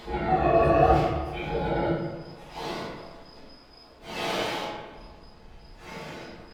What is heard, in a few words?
pig grunting grumbling; ambience; dfcaverns